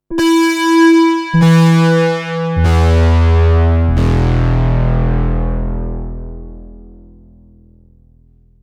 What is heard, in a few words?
Detune